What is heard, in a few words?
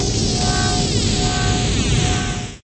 synthesized alarm